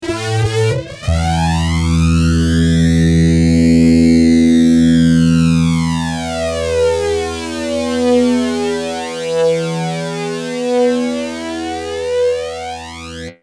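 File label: fuzz guitar, ADA flanger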